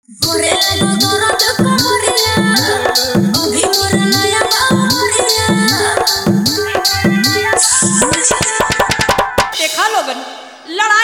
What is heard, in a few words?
Barati Julush Dance Special Mix Hard Jhan Jhan Bass Mix